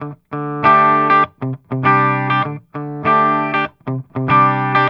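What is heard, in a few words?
Guitar D major to C(add9), Rock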